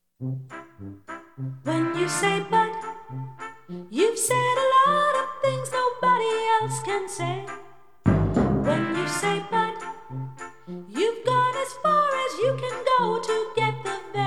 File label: Jingle